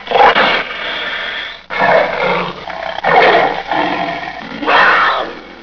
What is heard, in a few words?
panther